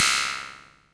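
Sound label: MISC Left, Techno 138 BPM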